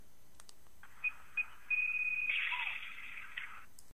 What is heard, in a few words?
Semtex Grenade; Mw2